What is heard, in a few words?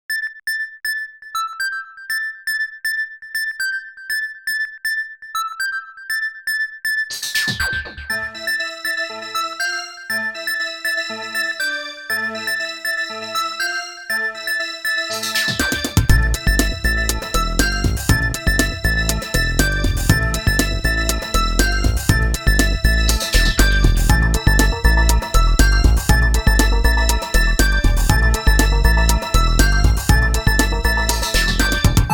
Electronic; Action 리듬